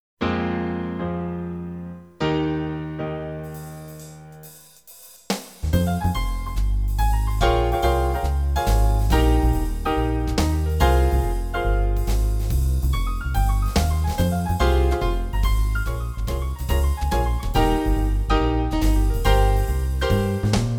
Jazz Version; Saber Rider and the Star Sheriffs

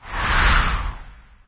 Tornado; candy crush saga gamerip

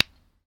Placing Toppings

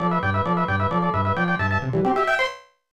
Victory!; Super Ninja Boy

